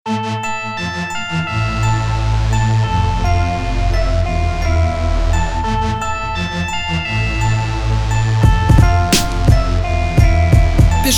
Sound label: rap